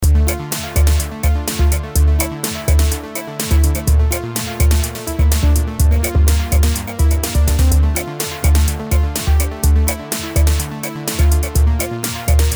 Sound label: ringtone